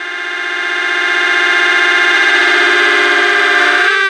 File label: Dubby Reverse Swell; Sonic Foundry (Sony Creative Software); Jazz Solos and Sections; Effected Sax